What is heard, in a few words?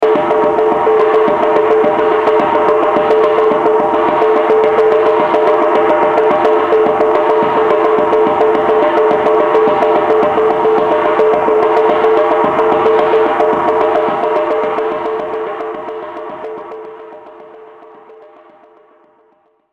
shrine dictorphone mexico, street recording